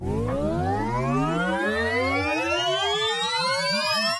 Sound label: rise up; I was messing with the SCP-294 "out of range" sound